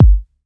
tight lows kick sample g key

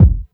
smooth steel kick drum sample f sharp key

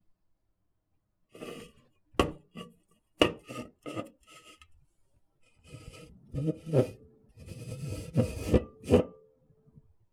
soda can noises kitchen table bang crush and rub

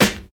studio snare drum sound c key

